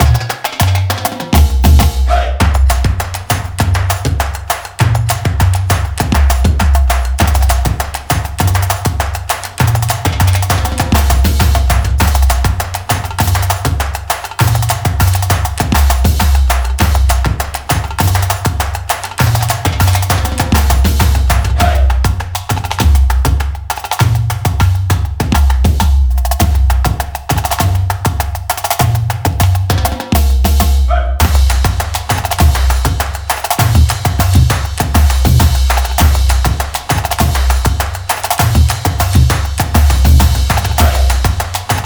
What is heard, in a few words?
Big Time Drum Line